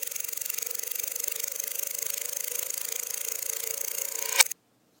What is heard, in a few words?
spin wheel audio